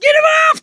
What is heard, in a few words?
scream while pounced, mechanic, survivor